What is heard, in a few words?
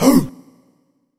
Augh Vox; Sonic Foundry (Sony Creative Software); Club Joints and Street Anthems; Ruff Side